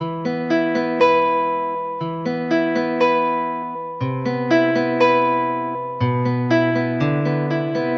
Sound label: guitar metal break, Loops